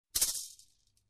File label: Short Shaker Rattle